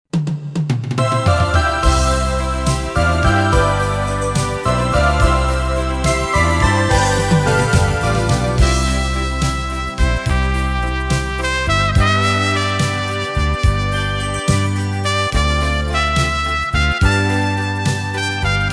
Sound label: KORG PA800 dancing